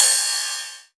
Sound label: Ride, Cymbals; Vengeance Samples